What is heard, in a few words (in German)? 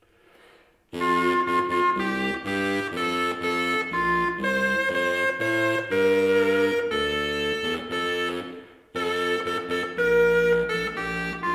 rankett mit krummhorn